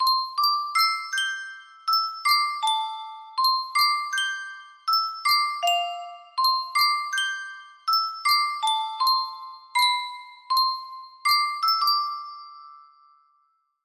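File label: music box, music box melody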